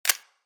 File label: hover